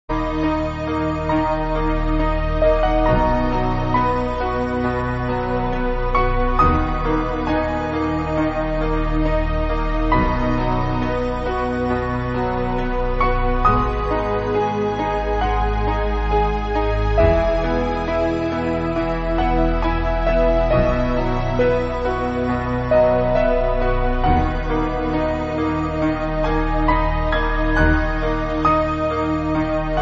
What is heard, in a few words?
Synth Sample